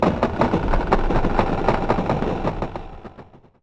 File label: Retro Game Drawbridge Creak; Maze